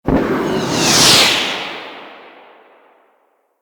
Boost Thruster Warp; Vehicles; Archwing

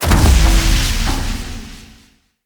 Metal Crate Destroy

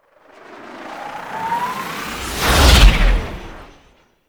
Fly By A; The New War; Archwing; Cinematics

